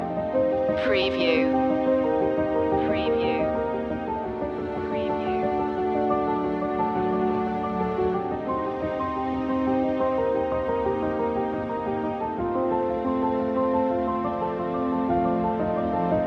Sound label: motion loop